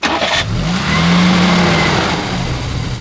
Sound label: merc on; Mafie 1